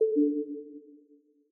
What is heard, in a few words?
lose; Evoplay; Heads Or Tails